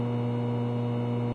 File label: machines